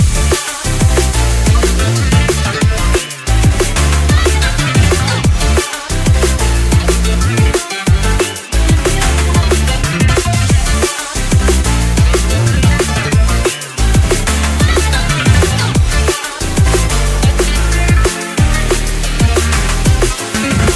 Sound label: Jingle Test Act; Sonic Momentum; SAGE 21